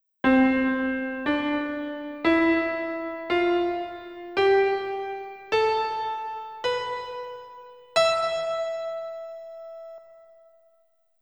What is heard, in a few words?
Piano Lesson; Tales of Phantasia 20th Anniversary Sound Box; SFC